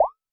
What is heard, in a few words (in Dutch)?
Ploep